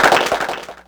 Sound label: Clapbox Pitch 5; Ableton Foundation FreePacks